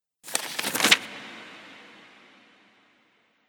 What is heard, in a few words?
Monastry Manuscript Paper